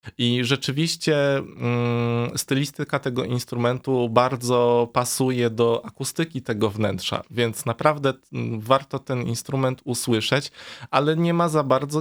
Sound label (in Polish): organy; Letnie Prezentacje Organowe w kościele sw marcina; Wrocławiu